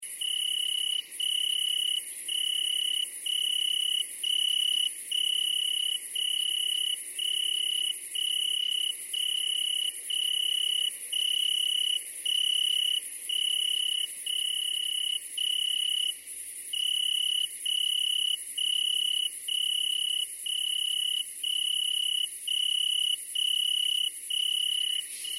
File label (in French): Grillon dItalie